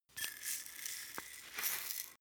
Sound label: herbs tilt slow; soundpool